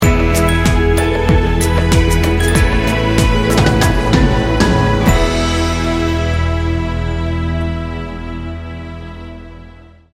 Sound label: pure americana sting bumper